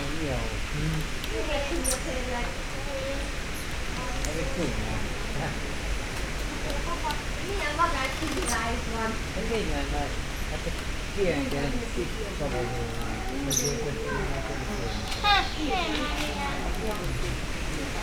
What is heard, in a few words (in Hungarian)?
magas csipogó hang fanyikorgás, aranyfejű oroszlánmajom; szegedi zoo 2011